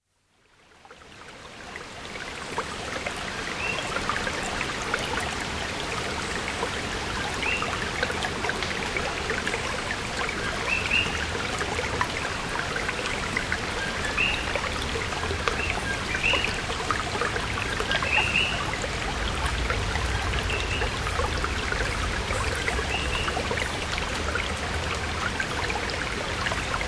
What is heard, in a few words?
Stream Atmosphere looped